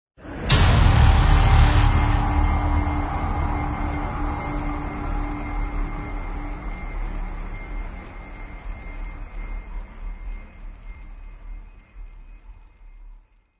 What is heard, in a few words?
Epic Trailer Intro Ident Intro Hit